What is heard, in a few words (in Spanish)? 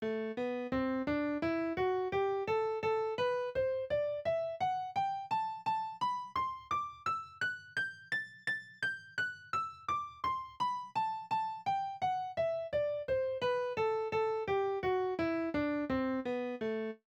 escala dórica